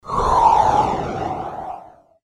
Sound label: airy smooth phase